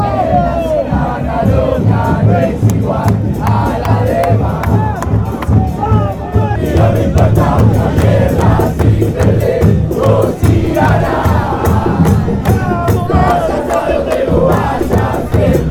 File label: deportivo merlo chant